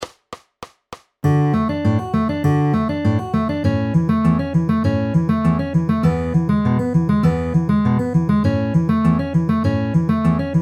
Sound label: D A E A pinch middle middle with alternating bass at 200 bpm